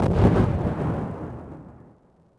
distant explo; impacts explos

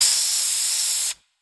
Spray 2; Weapons

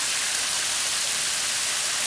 water spray; Soldier Of Fortune II Double Helix; XBOX; ambience

